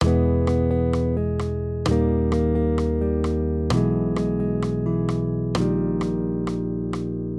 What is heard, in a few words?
maj triads; accompaniment